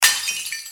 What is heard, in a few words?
guard break